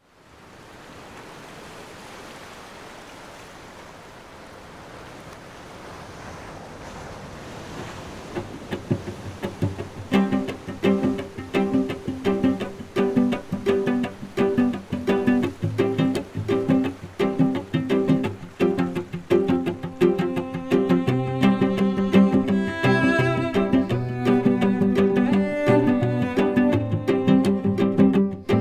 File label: Simple Gifts Piano Cello